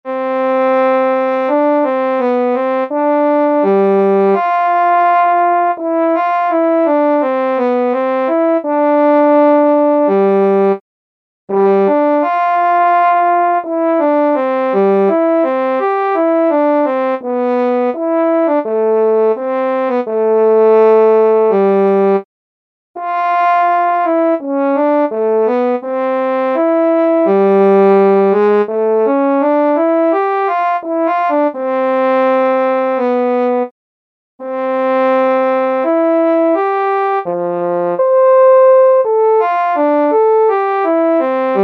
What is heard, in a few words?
french horn lyrical audition; all state